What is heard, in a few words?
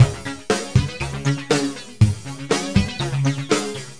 TECHNO